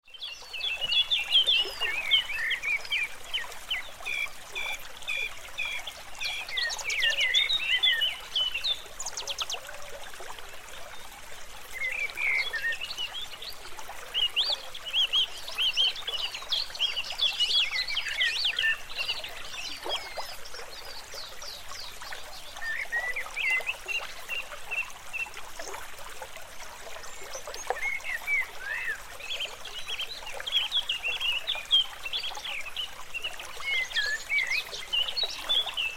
River ambience light wind in the trees and birdsong